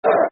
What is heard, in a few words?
You What, Football Chants; Derby County Dcfc